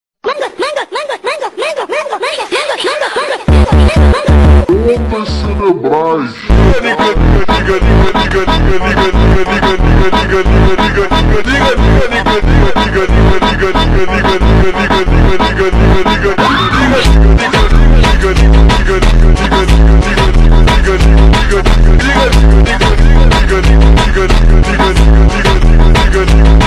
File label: MONTAGEM FUNK (Slowed + Reverb) microecho